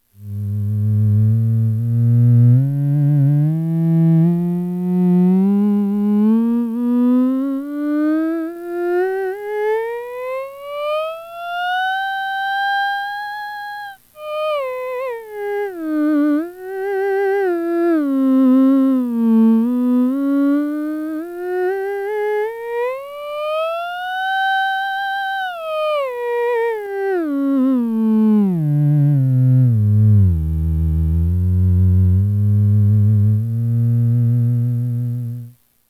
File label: ews enhancer mic, theremin